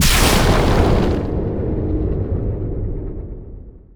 frost blast; weapons; immortal; dota; lich

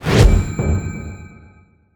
blink in; dota; queenofpain

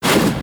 melee pre attack; terrorblade

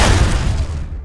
attack impact; lion

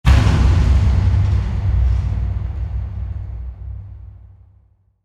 dead water target; tidehunter

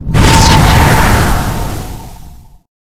reapers scythe; weapons; dota; necrolyte

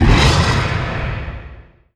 splinter blast cast; winter wyvern

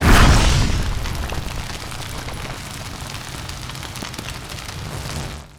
burning spear; weapons; dota; huskar

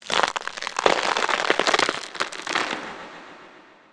overgrowth target; treant

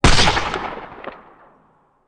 attack; ult; Dota 2; muerta

